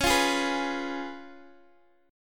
Db 7th Db down Guitar DADGAD

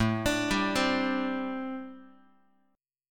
A Minor 11th, Guitar; Am11 arpeggiated; x,5,5,5,4,x; 4A,4E,5A,5D,5G#,6C#

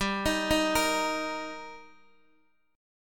G Minor Sharp 5th G Guitar DADGAD, chord

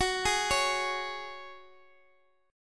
F sharp Suspended 2nd

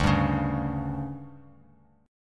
D Major 7th Suspended 4th, chord; DM7sus4; strummed; JGuitar

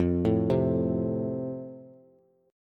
F Major, Bass; arpeggiated

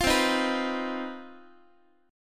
C Minor Major 7th Double Flat 5th chord, Guitar; strummed; JGuitar

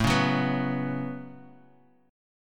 A 6th Add 9th A down Bass Standard; A6add9; strummed

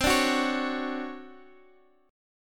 D Major 7th, chord; Dmaj7/C; strummed; JGuitar

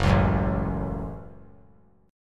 Gb Minor Gb; strummed; JGuitar

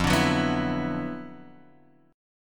D 6th Add 9th F, Guitar, Standard; D6add9/F strummed; JGuitar

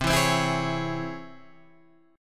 Db Minor Major 7th C

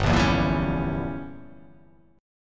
G Diminished 7th

